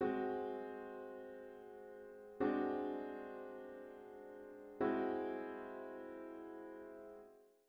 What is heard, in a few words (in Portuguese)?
Estudo de Harmonia acordes de sexta aumentada italiana, francesa, alemã